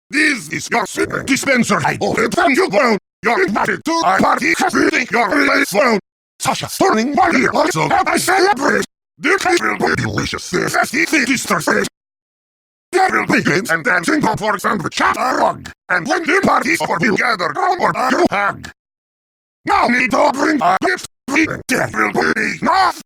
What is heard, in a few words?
Heavy's Singing Dispenser (Acapella)